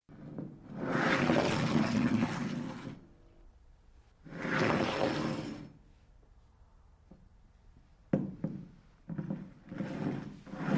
Beer Mug Slide; Glass Mug Scraping And Sliding Over Surface With Small Ringing Out. Several Mug Put-downs And Pushes.; bars & discos